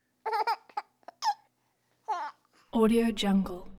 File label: baby laughing; prosoundfx